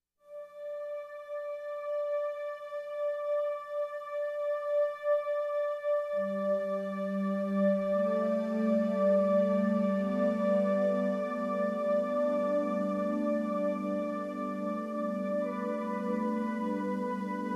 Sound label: Instrumental